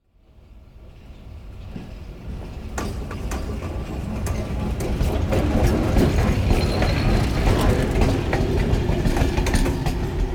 Train Arriving And Stopping European Train - Slow Speed Heavy Track Clicks; and Brake Squeak; Hollywood Edge; sound designer's toolkit v2